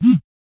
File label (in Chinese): 振动音效